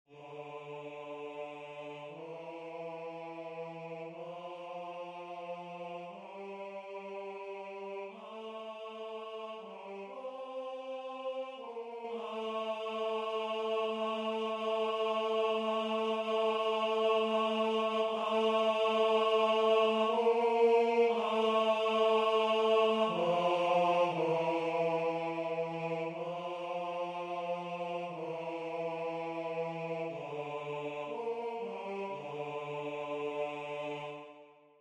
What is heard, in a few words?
tenore